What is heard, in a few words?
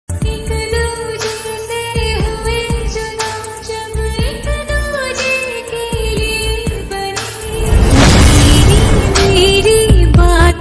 slow reverb hindi ringtone